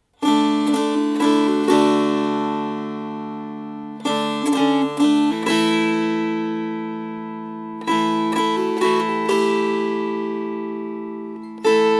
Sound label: Strummed